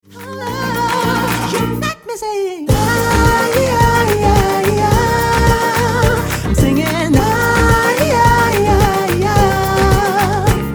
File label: Acoustic Salsa Cebuana Mix; 90s